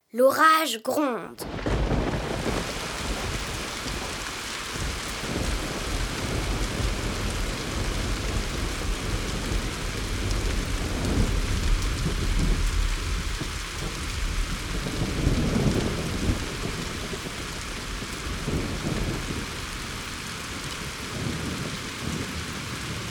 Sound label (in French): L orage; Mon imagier sonore de la nature